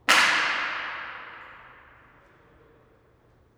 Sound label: stairs